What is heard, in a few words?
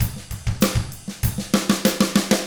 HARD ROCK, Drums; ILIO; Double Platinum Drums 1